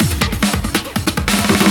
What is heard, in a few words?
Loops Fills; Vengeance Samples